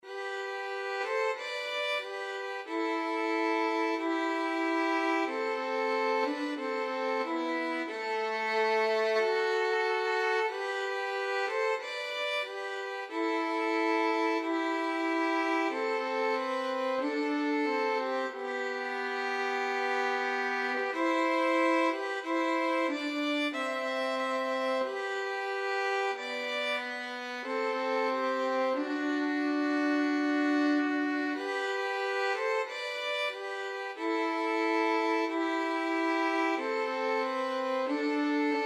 bleak midwinter violin duet

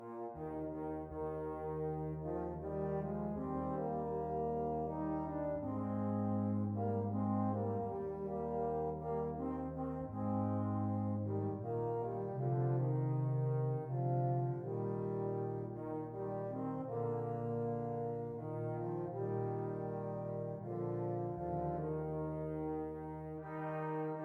Shenandoah, brass quintet

